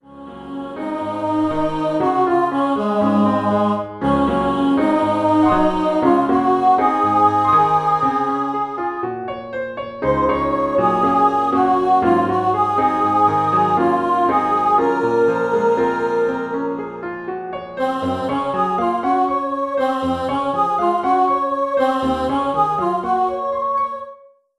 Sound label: vocal solo + PA